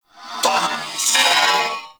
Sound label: Purgatory Warrior Possess Scream; Warframe